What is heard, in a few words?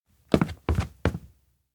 steps indoor abandoned cafe step back; anemoi